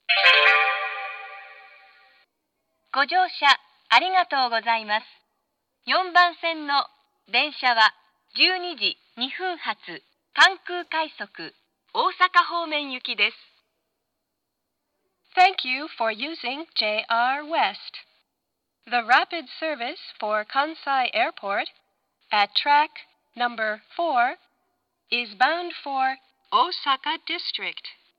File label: rapid; jr-west; kansai-airport; kansai-airport-rapid; for-osaka